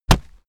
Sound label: punch